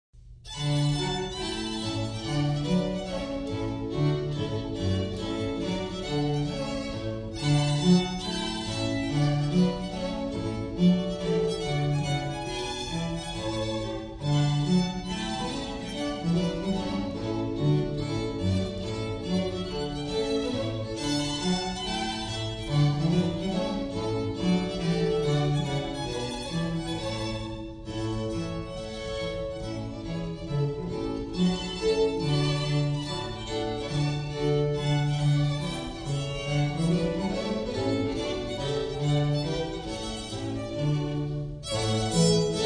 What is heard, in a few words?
Trumpet Tune; Purcell